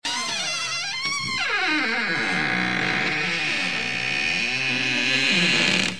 Horror Door Opening Ringtone; ringtones

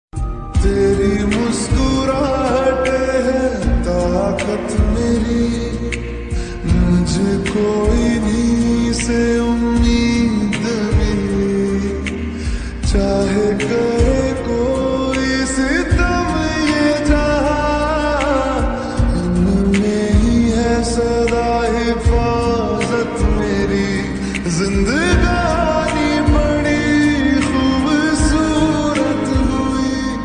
Sad Mood Off Ringtone

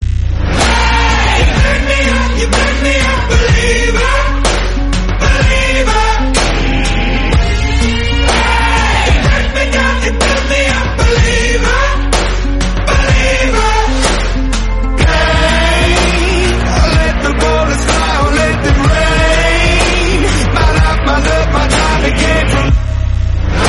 English Song

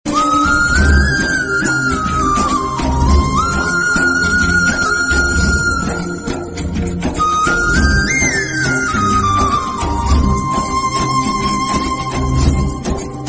Rain Theme Flute Ringtone; RHTDM; Madhavan

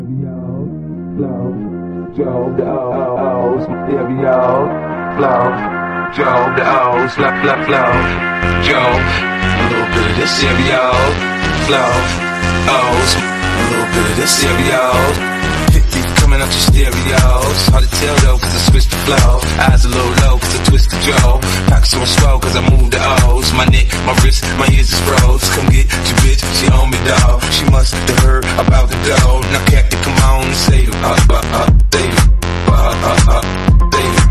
Loud Remix Music

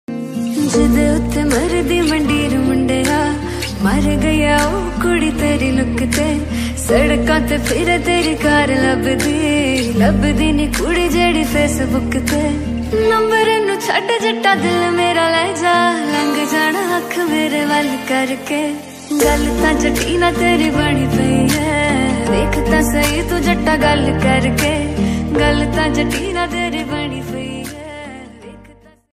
Punjabi Song Ringtone

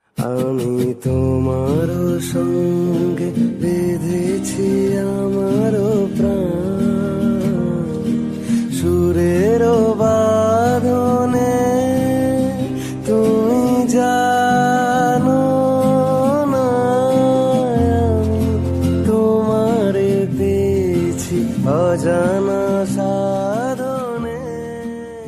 Rabindra Sangeet

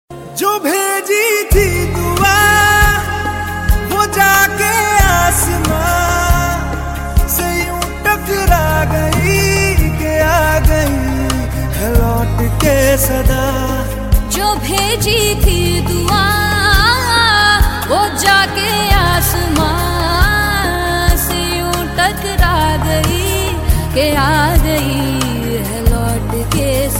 Bollywood Song Ringtone

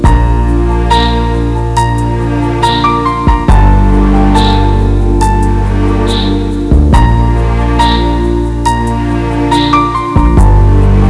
Sad Ringtone

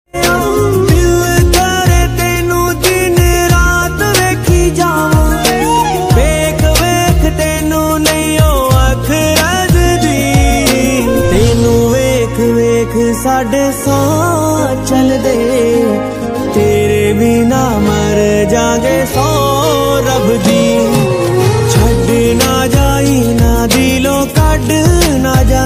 Ringtone

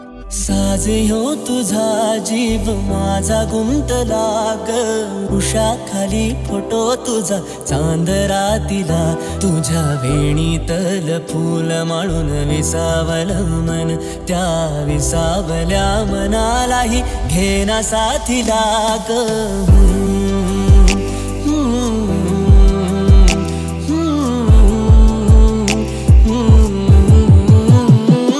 Movie Ringtone

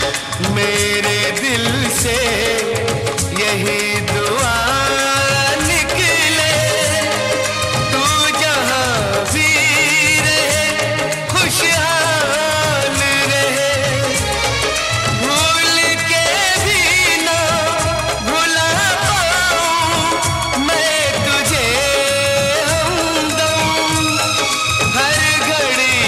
Sad Friendship Ringtone

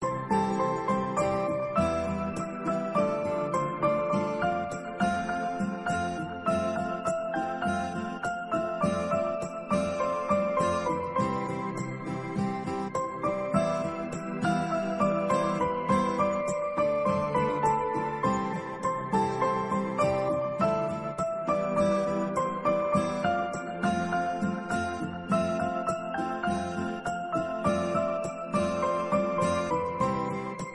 Instrumental Ringtone

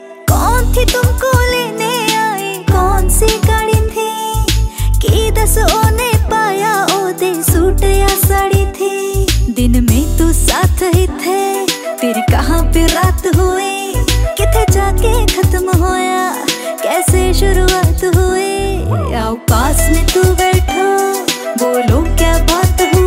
Female Version Ringtone

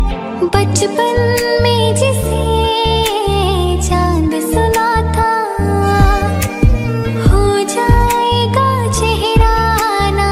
Sad Song Ringtone

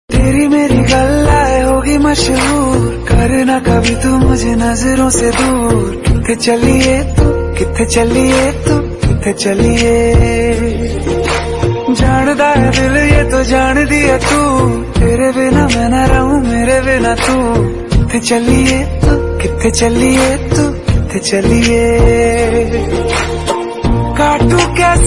Love ringtone

